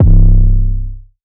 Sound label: Harmonic 808 - C; 808s; Cymatics; 2022 Melody Mystery Expansion